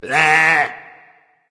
tongue berserker; cuchulainn

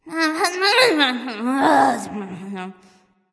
grumbling; smite; scylla

